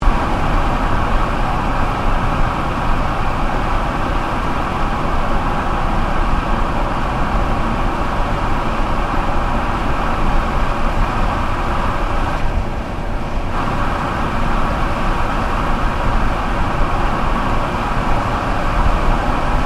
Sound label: Car Ford Focus Cruise Exhaust; Ford Focus hire car; Cars (Sound Effects:Vehicles)